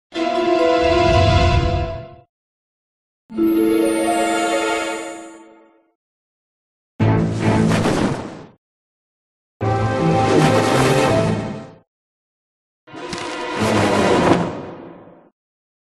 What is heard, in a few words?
Transitional Swipe Fanfare; batman toxic chill